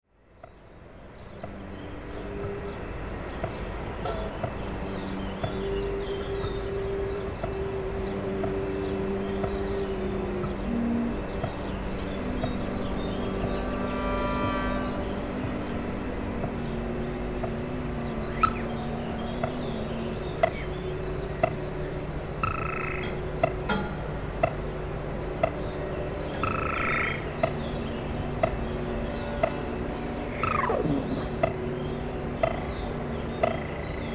sirènes, extrait des performances improvisées; Les quatre pistes sont jouées en même temps (les quatre musiciens)

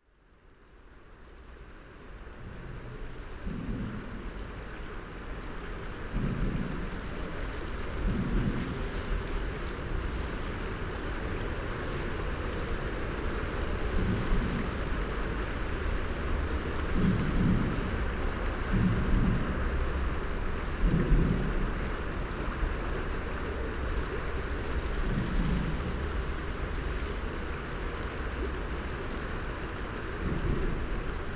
pont; Ile Verte; voix d eau

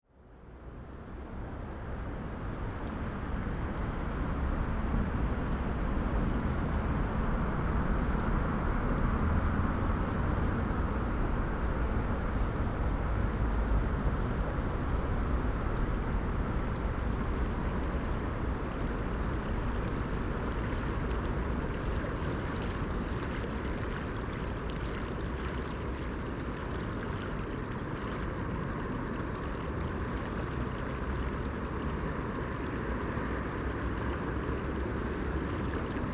pont; Chartreuse; voix d eau

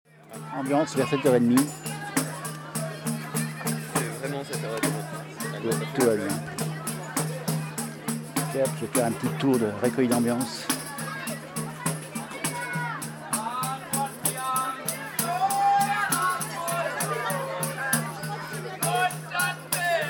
tour d AMBIANCE; les ondes de l'eau

